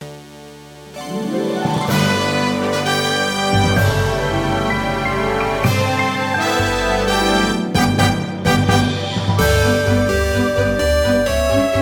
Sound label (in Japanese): 決意旅立ち